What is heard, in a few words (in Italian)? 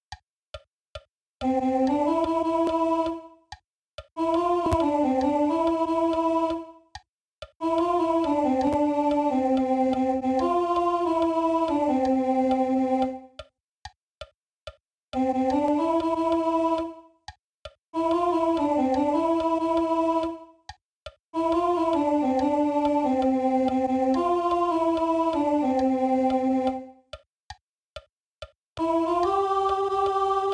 Inno SOP